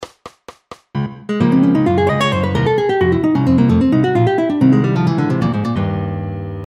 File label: fill